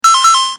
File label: nes pause